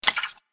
slime; creature

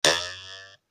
spring

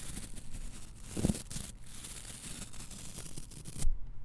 Scratchy Fabric; BB Retail Therapy Sample Pack

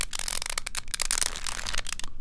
Small Cube - Squeeze, rubik's cube

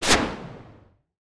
retro explosion, synth

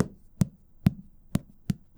Softcover Taps; bb - Books, Paper, Writing

